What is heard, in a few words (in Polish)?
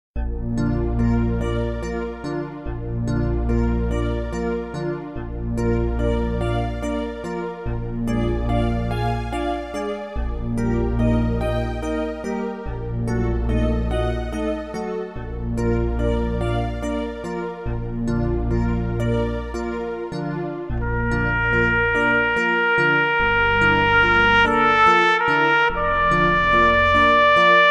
ave maria schuberta 2 trabki